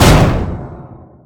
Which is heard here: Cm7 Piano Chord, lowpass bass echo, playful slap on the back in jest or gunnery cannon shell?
gunnery cannon shell